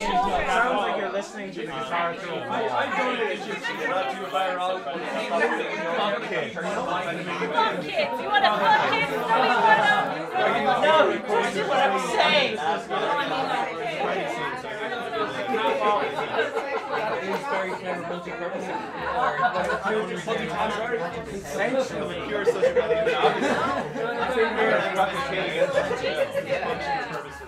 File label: Party Background Chatter Real